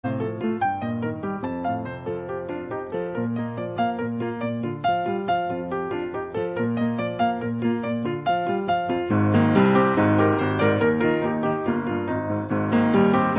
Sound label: Piano; Boulder Dash I and II